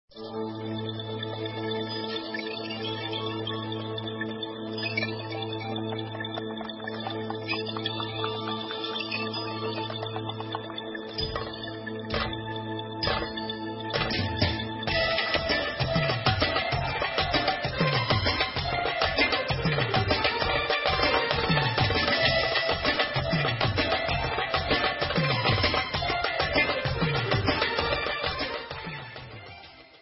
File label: Instrumental